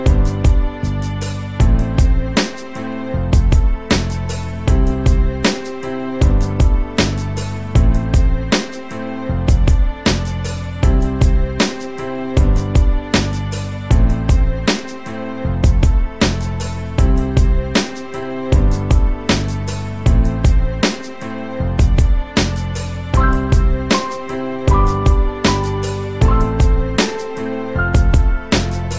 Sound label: happyness piano; ringtones